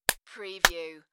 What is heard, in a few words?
Clap Clap; beanstalk audio